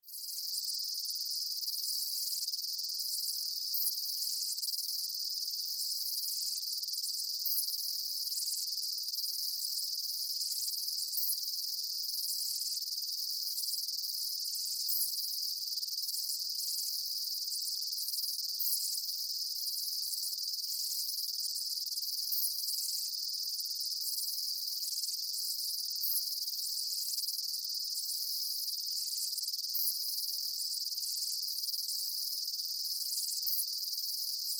Jungle Day, Ambience, Jungle, Day, Chirps, Insects, Bugs; 5.1; Surround Sound; BlastWave